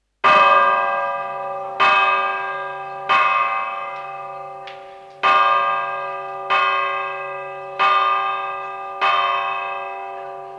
campanas, a confesar

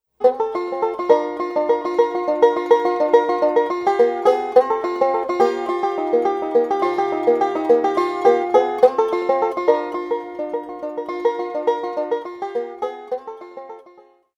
banjo

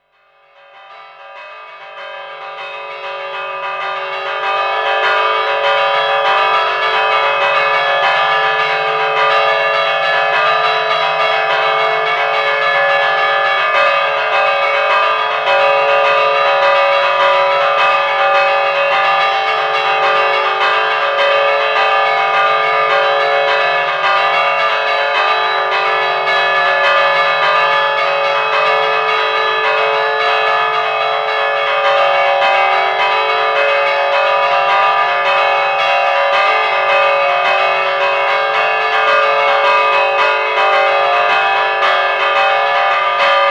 toque de fiesta, campanas

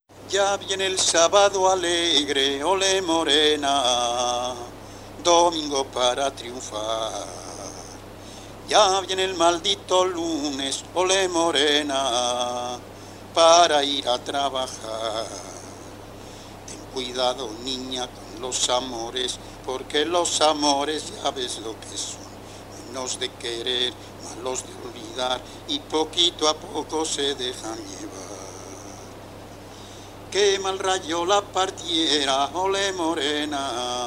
Jota, Villabragima; Ten cuidado niña con los amores

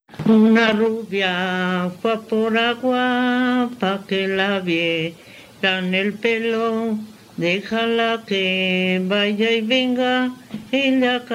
jota, Villabragima; A la comba (jota). Villabrágima

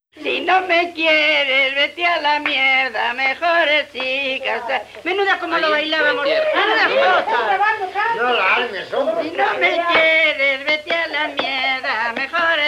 Jota de Gallegos de Hornija

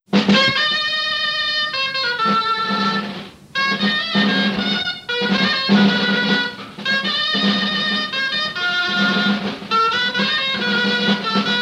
Baile asentado; La Pedraja de Portillo; Baile asentado II. La Pedraja de Portillo

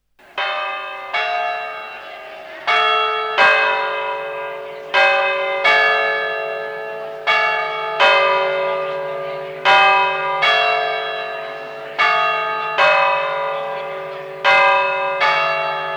campanas

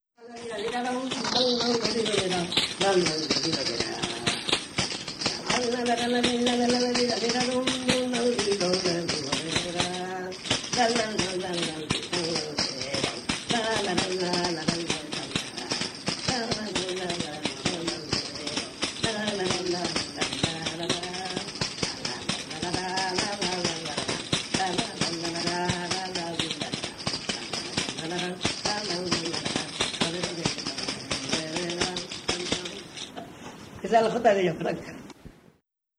Acompanamiento de pandereta para la jota; Villafranca de Duero

